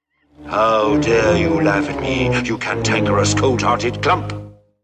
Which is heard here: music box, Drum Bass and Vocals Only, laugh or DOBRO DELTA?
laugh